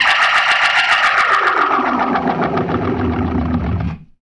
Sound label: GLISS, ORGAN RIFFS; InVision Interactive